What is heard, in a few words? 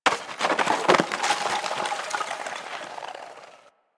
Landscape Ice Slide Medium, Ambience; Warframe; Venus Open World; One Offs